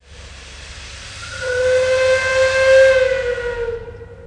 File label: Shift Whistle; Dominion